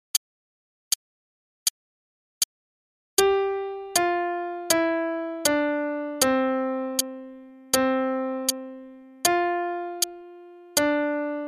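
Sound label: Starlit Melody, piano; Faber Adult All-in-One Book 1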